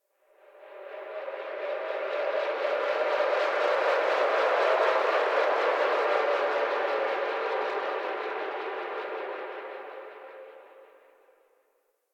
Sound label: Railjack exterior drifting energy; Ambience; Warframe